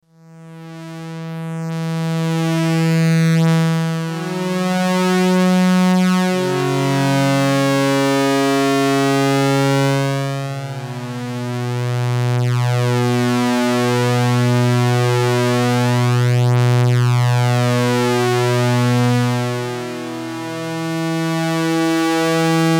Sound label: memorymoog; blade runner